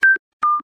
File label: sidewalk light red